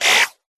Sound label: science fiction computer; Audio Mangler